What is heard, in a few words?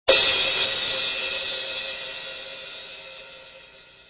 texture hit